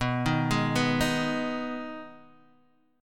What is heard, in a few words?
B 6th B down Guitar Half Step Down